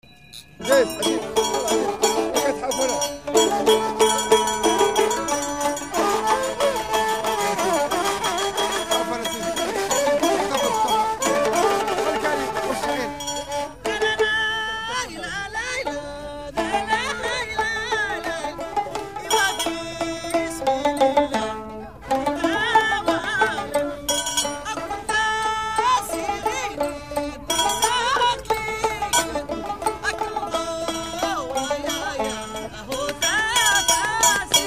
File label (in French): Chant du gnâwa berbère